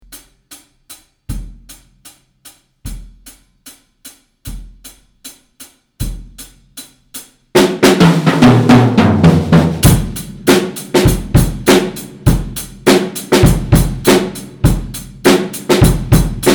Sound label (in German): Schlagzeug